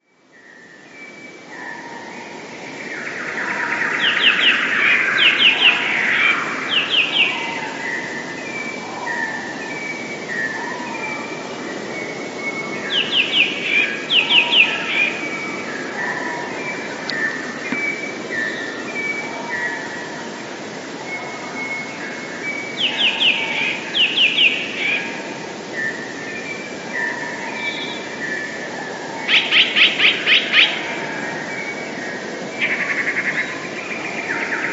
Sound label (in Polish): spiew; dwukrotnym zwolnieniu i obniżeniu o oktawę